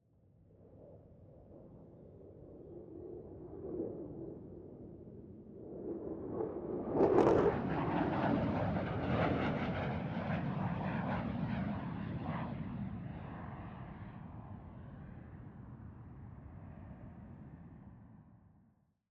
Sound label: Jet, Fighter, F-16C, Fighting Falcon, Medium Distant, By, Right to Centre, Curl Away, Fast; Viper; Airborne Sound; Attack Aircraft Maneuvers